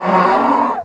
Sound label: hardrock mommoth attack